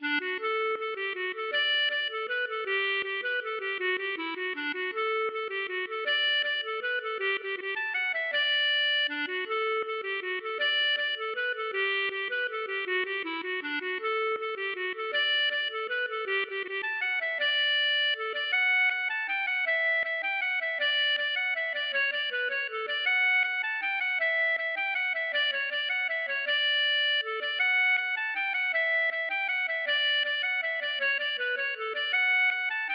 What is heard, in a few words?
mazurka